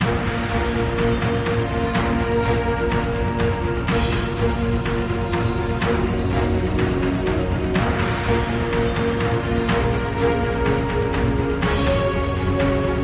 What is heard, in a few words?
Big Win Music Tally; forbidden Throne Desktop; Mahi Gaming